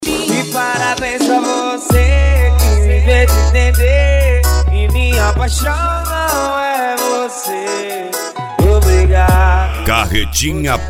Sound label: mega funk; carretinha power sound volume 2